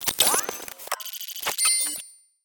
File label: robotic robot cyber tech nano micro complex movement mechanical; nanotech; Sonniss GDC